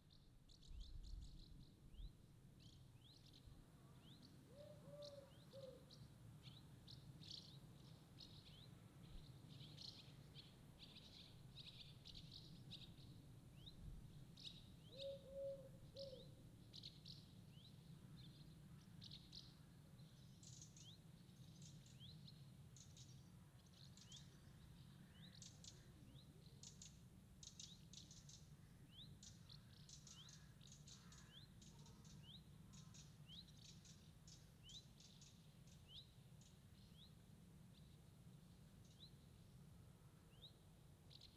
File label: Angelus Bells